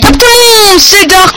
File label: ear rape